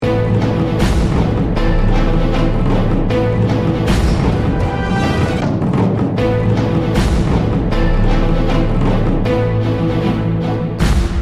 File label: Music Loop; battle bears 1